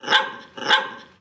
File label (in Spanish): perro